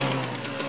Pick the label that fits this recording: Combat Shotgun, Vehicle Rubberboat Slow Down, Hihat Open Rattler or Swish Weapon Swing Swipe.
Vehicle Rubberboat Slow Down